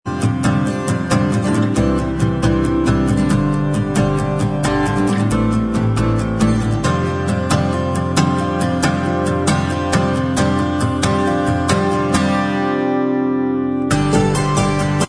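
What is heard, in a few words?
acoustic, instrumental, backing track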